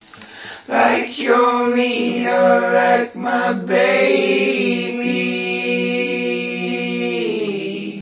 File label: 120 male; MELODIC LOOPS; VOCAL OR VOXY LOOPS